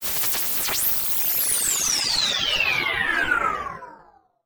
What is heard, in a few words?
Alien Wierdness Descending, Sci Fi; 1001 Sound Effects